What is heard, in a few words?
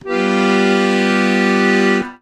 AKORDE G 7; ACCORD.CHRDS